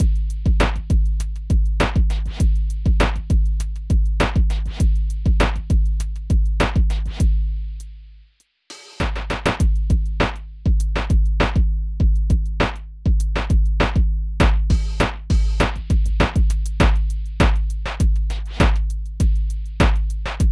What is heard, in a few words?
Boom Beat